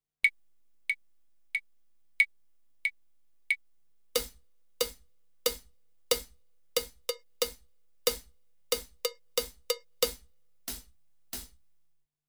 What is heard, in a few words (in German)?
Rhythmus Schreiben